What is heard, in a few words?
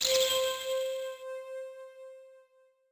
Intro Celtic